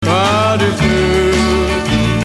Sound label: Resolution